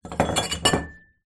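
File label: Windchimes Table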